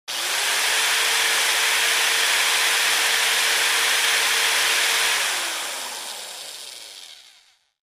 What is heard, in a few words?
Electric drill being turned on and off.; Tools, Hand Drill Motor, Drill